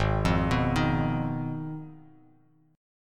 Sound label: G sharp Minor 6th G sharp down Bass Standard; G#m6 arpeggiated; JGuitar